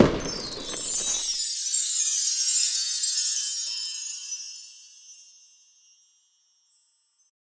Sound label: Level Complete